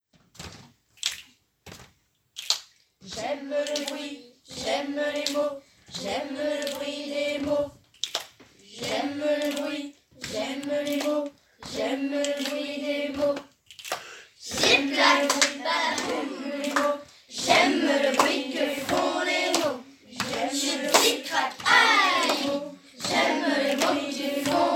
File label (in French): zip clac; bourg-charente; cm1-cm2